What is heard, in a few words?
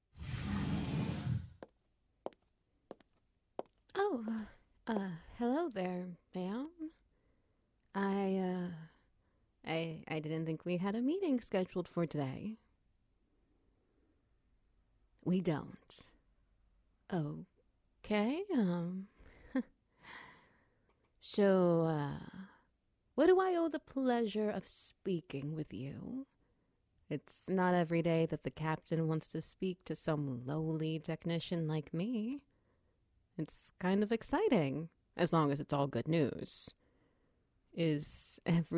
Wet Noises; Telepathic Crush